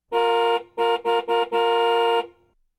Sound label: Car Several Honks